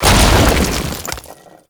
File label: Condemn Earth Crack; Warframe; Priest